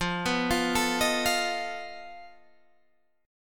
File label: F 7th Flat 5th F down